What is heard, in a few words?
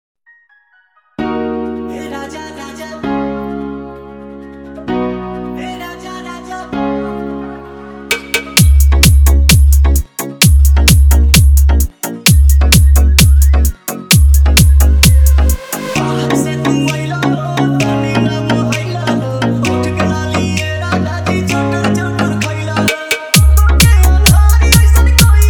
Bhojpuri Dj Remix Songs Hard Vibration Mix